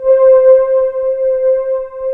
ARP, Analog Fantasies; USB Soundscan vol.49; AKAI

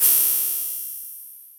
hat (rez); Sonic Foundry (Sony Creative Software); Ugly Remnants VOL-2; Beatstuff

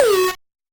Noise, 8-bit, Bitty Noises

